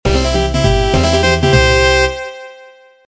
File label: Soltian Roulette Win; Clockwork Knight 2; saturn